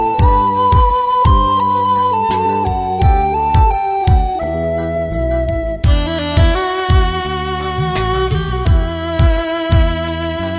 Romantic Flute Ringtone

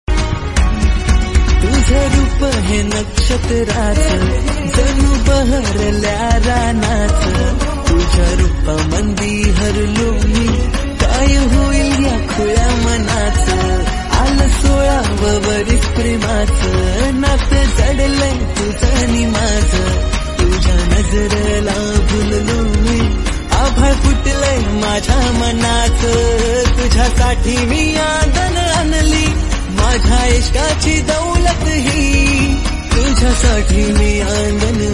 Marathi Ringtone

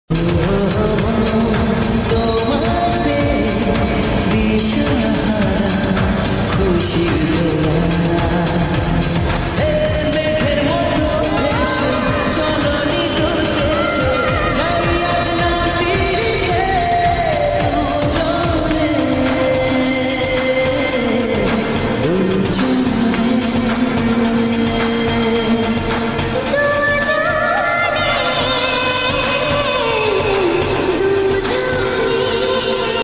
Bengali Song Ringtone

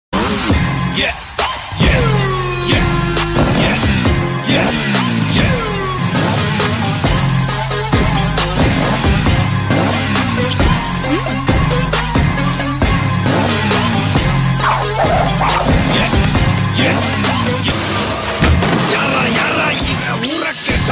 Theme Music Ringtone; Bairava

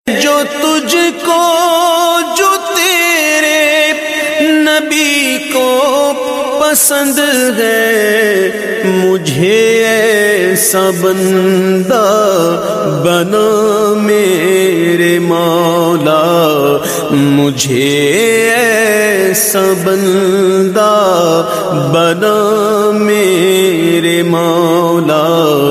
Islamic Naat Ringtone

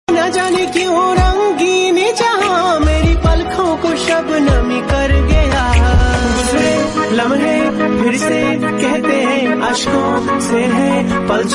Hindi Love Song Ringtone

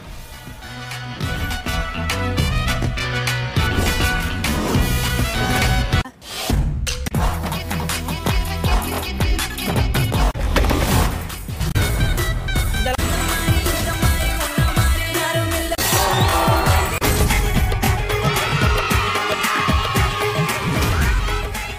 Bgm Ringtone

